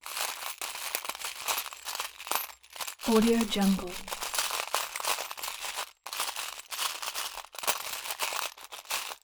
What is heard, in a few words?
nails toggles key plastic box; aln